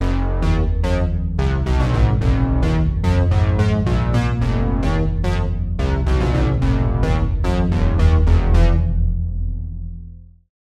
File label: Oscillator BASS Fuzzy Circuit; Alphatron